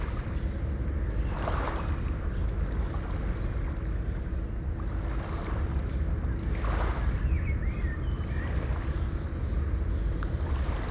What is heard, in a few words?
day distant water